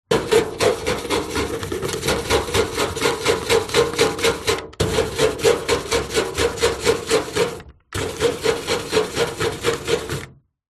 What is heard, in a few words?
industrial wire brush tool rub metal